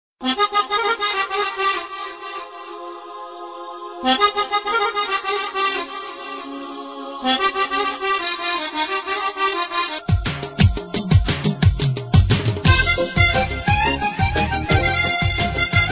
Akardion

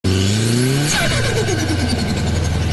Subaru flutter

